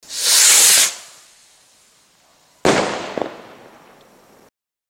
firework

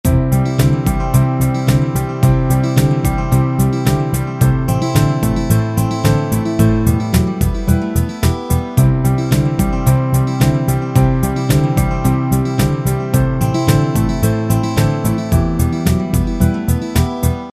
Beat

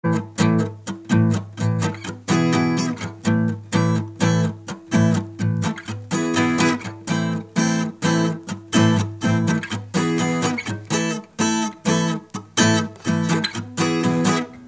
Gutar ringtone

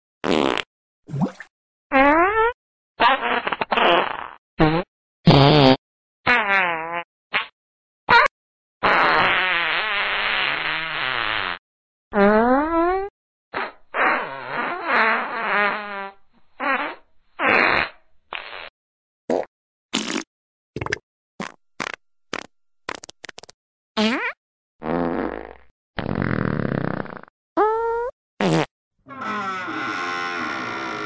santa fart

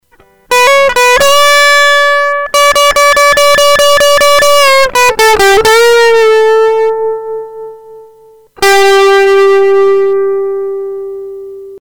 guitar

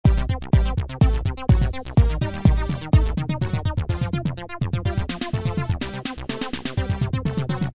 Beat

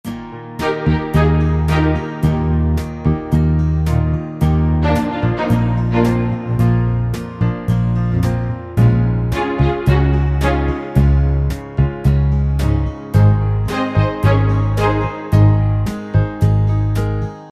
Beat

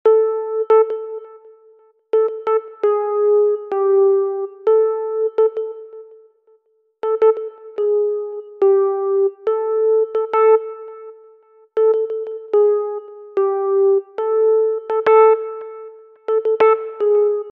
Piano

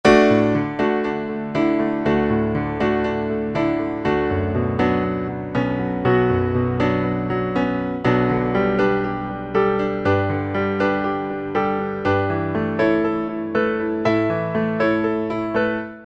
Beat

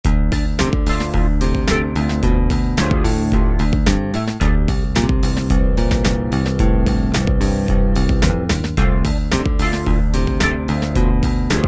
Beat

